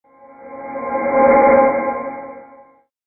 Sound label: reverse tonal diffused